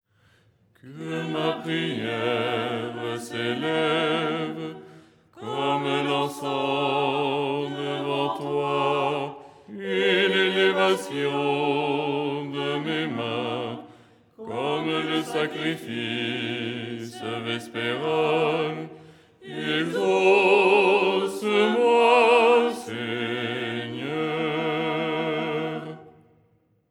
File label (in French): basse; " Que ma prière s'élève…"; Les 8 tons en français; ton 6